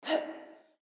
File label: Jump; GGJ2022